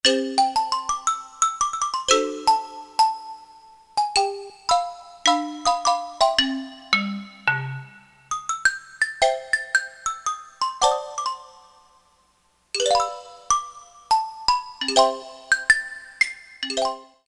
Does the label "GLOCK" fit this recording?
Yes